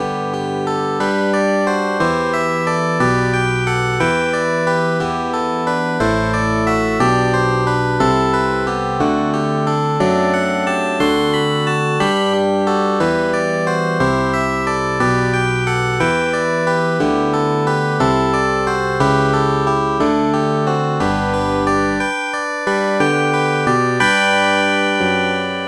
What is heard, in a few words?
picked bass